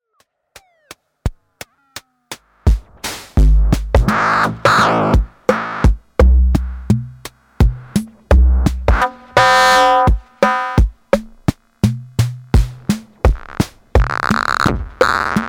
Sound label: Noisering Shuffle